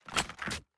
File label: inspect, weapons; cstrike; valorant; singurality phantom